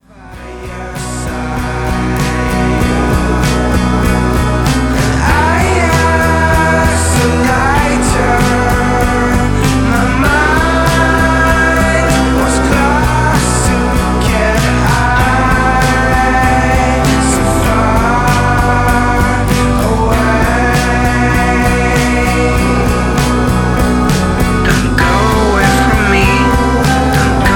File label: organeum